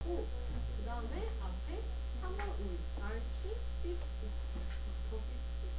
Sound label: chick